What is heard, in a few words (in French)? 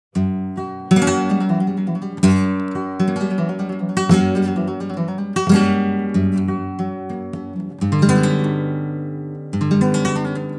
guitare Kremona epicea palissandre